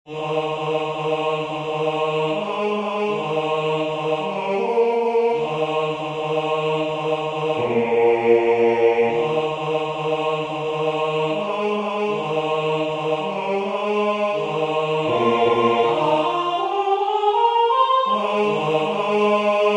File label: Bass